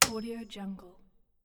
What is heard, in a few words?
small metal hit; stormwaveaudio